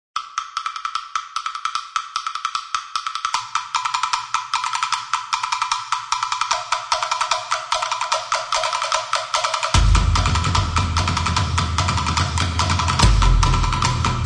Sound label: drums